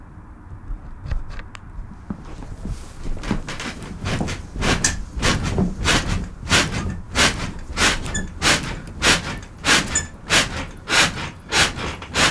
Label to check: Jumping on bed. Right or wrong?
Right